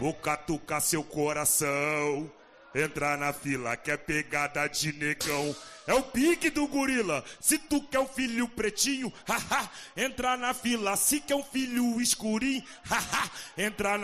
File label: funk